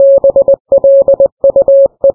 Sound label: morse